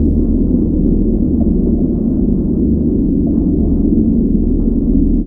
Mind Drone; Jungle; Blu Mar Ten - Jungle Jungle - 1989 to 1999 Samplepack

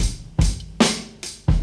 Breakbeats

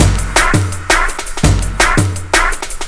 Metropolis Break; Jungle; Breaks; Blu Mar Ten - Jungle Jungle - 1989 to 1999 Samplepack

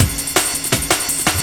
Cosmik Break, 11A, Jungle Breaks; Blu Mar Ten - Jungle Jungle - 1989 to 1999 Samplepack

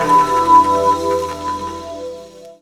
Chord - 9A, Jungle; Riffs, Arps & Hits; Blu Mar Ten - Jungle Jungle - 1989 to 1999 Samplepack

